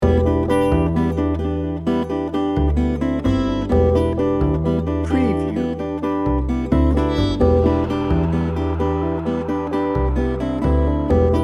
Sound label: breezy and positive loop